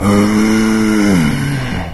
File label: zombie idle; doom3